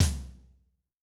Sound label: RoomQF, Tom, Yamaha DTXpress-II